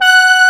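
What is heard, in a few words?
brass G5, synthesizer, analog brass; AKAI S6000 CD-ROM - Volume 1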